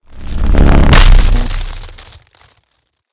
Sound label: Surge punch